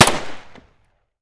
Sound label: glock17 fire distant; Escape from Tarkov